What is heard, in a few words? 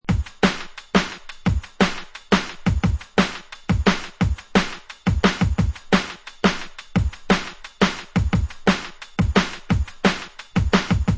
Dread Rollerz Steppaz, beats; ray keith dread jungle breaks vol 1 60 beats for dubplate clashes battle junkies